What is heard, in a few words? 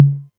tom mid, Novation Drumstation